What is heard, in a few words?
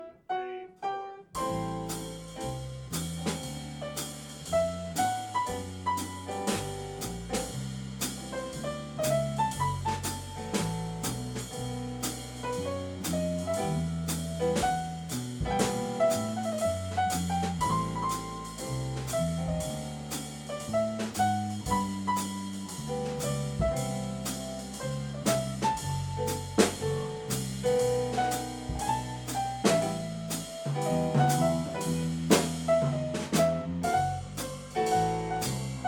jazz